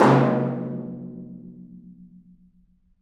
Af. Hand Drm, Ambient; Roland LCDP14 Africa VOL-2; Ambient Tar

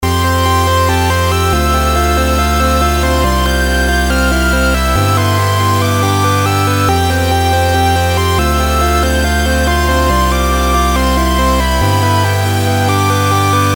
space

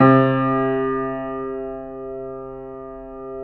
Keyboards Acoustic, C2; USB Soundscan vol.17; AKAI

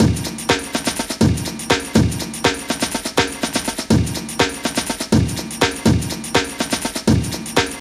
Chopped Loop, Drums & Percussion, Electronica; Sonic Foundry (Sony Creative Software) - Electronic Point Blank

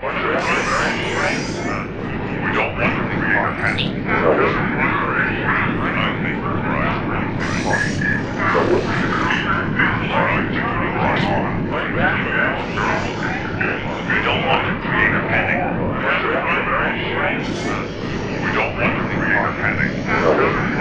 crowded loop; Sonic Foundry (Sony Creative Software); Ugly Remnants VOL-2; UR 2 FX (Long)

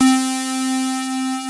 FUZZ LEAD, PPG, Analog; C3-L; USB Soundscan vol.49 - Analog Fantasies; AKAI

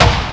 hit, PERC; Techno Trance Essentials

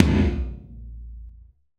Tom, Gated Reverb; creative gate; musicradar

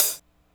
hihat, Hardcore Breakbeat; Big Fish Audio; Hardcore Breakbeat Action

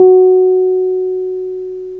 PNO Wurly; Roland LCDP10 Keys of the 60s and 70s 2; Wurly 200B

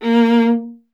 viola A; Miroslav Vitous; Solo Instruments VOL-1

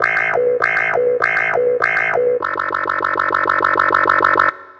Clavinet FX; Sonic Foundry (Sony Creative Software); Club Joints and Street Anthems

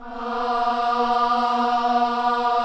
KIDS CHOIR, Gospel; Spectrasonics; Vocal Planet

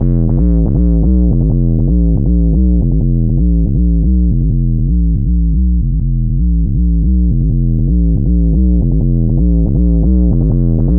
Busy Bass; Sonic Foundry (Sony Creative Software); Knutrix Drum & Bass

